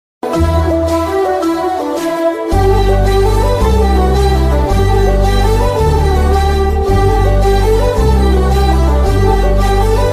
south indian ringtone, love ringtone

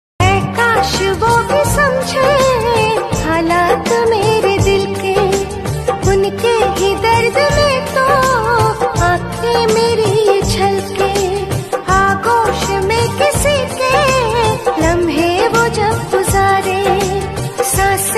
gam bhari ringtone, Hindi

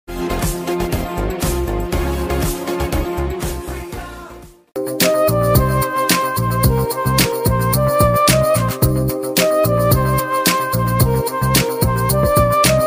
love ringtone instrumental ringtone; ghajini instrumental ringtone

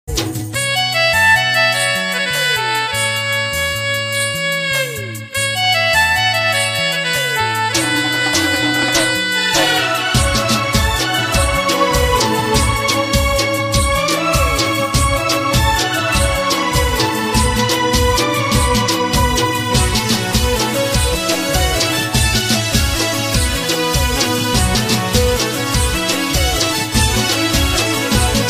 Gujarati ringtone

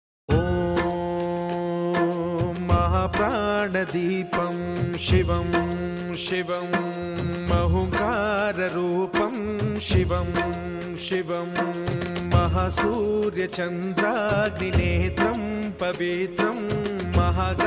devotional song in telugu; lord shiva

